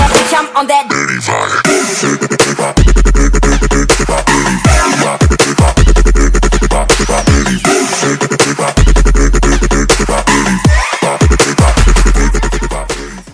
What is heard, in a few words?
electro; roundsound